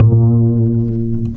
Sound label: Upright Bass Notes